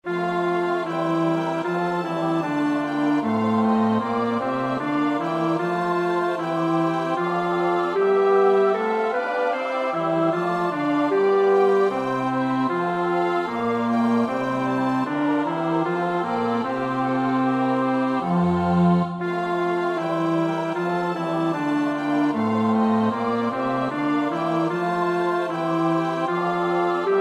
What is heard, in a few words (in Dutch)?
bas, koorpartij oefening; Bach (Johann Sebastiaan); BWV 172; Von Gott kömmet mir ein Freudenschein